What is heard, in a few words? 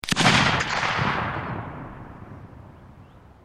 rifle shot; weapons